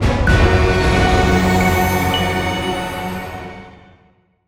JINGLE RESULT VICTORY; Titan Slayer

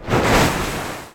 geyser attack; Level2 Ice Fields

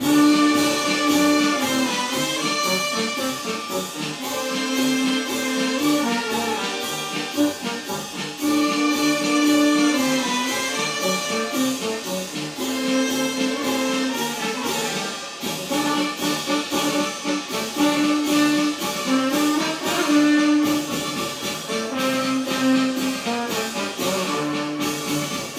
Paso Doble; Torero Arte y Pasion en la Arena

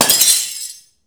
plate glass hammer break

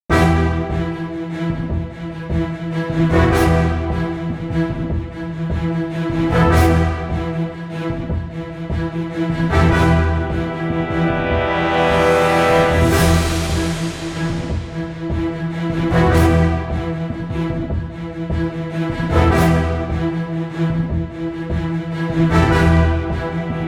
Cheesy Battle Music; Shining Song Starnova Linux macOS Windows gamerip 2018

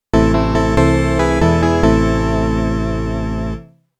Sad Occasion; FM-TOWNS

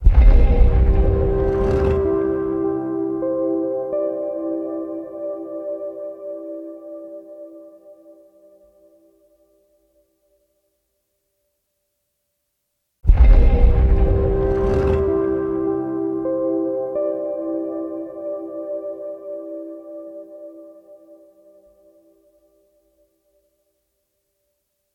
Jingles

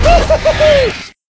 Player Join

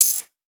UI Suite Rapair; We Are the Dwarves

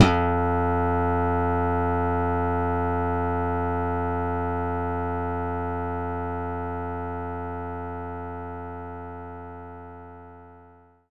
Slap Bass F3; knights try

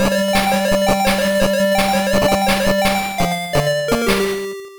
Win 2-player Race; Micro Machines 2 Game Gear

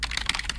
Typing

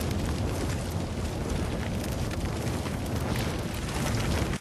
Flames Loop; Hero Beresad

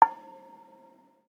Click down; android; Does Not Commute

